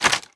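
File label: equip movement; Valorant; cstrike; operator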